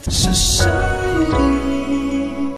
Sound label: Society Jingle